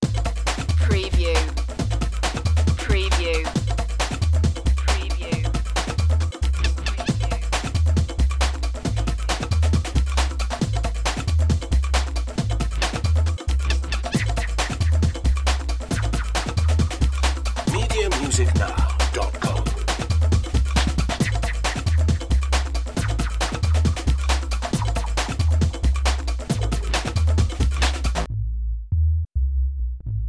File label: Jungle Ambience, A repetitive bass throug